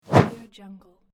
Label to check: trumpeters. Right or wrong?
Wrong